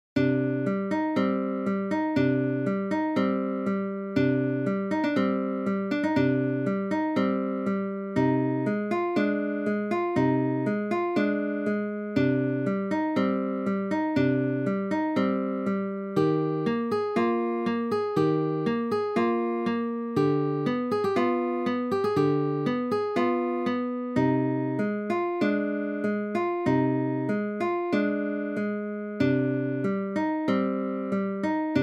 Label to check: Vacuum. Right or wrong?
Wrong